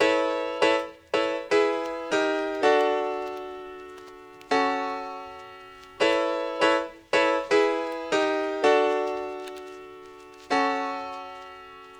Classic Melody Loop 80 BPM A Maj Piano; Melodics; Loops; Loop Stems; Cymatics; Eternity

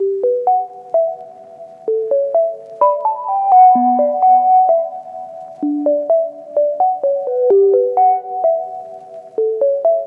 Melody Loop, 128 BPM B Min; Synth Loops; Cymatics; Light Free Sample Pack; Bonus Samples

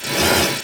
piano fx, Cartoon Film Effects

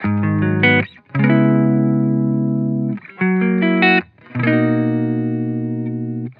Vibrations Chill Guitar Loop 150 BPM B Maj; Cymatics; Vibrations Guitar Sample Pack

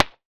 Future Bass Perc; Drums; One Shots; Percussion; Cymatics; EDM Starter Pack

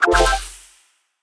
Gravity Switch FX FMaj7; Video Game; Cymatics; Video Game Sample Pack Vol 1

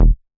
Squarehead Bass E

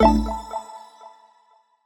Menu FX Organic - A6(Sus2), Video Game; Cymatics; Video Game Sample Pack Vol 1

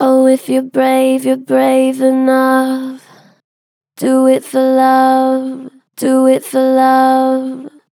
Gentle Flat, Oh if your brave, Vocals; Harmor Vocals

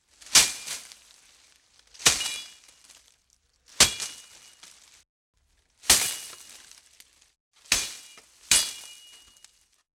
Machete Bush Whacks Rings; Fairlight Sound Lib

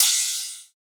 Crash, Trap Drums; One Shots; GhostHack; Achieve

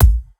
Bassdrum, Dubstep

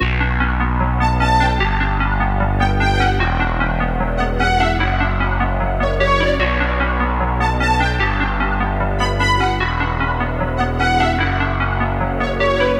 150 BPM B Min, Synth Loops; ARP+MM+P5; Universal; Cymatics - Fantasy Synth Sample Pack

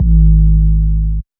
808, G#; Cymatics; Light Free Sample Pack; Light Samples

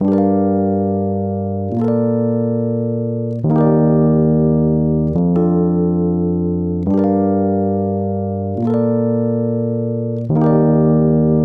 Future Bass E Piano Loop - 140 BPM G Min; Instruments; Piano Loops

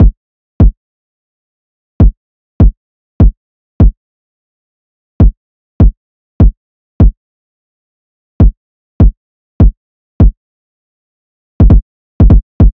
Drum Loop - 150 BPM Kick; Drum Loops; Loop Stems; Cymatics; Mystery Pack Vol 4; Konopio Drum Loop

